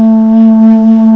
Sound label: FLUTE, WIND